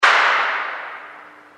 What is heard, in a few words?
clap